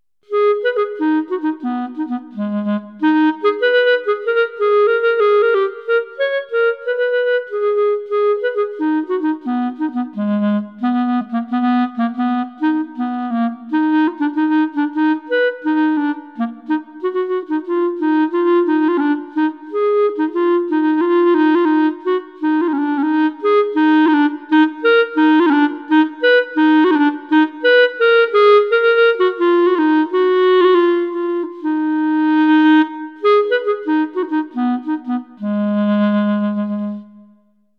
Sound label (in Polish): klarnet; Badinerie